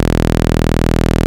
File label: 2600 E2, ARP 2600; Hollywood Edge - Giorgio Moroder Rare Synthesizer Collection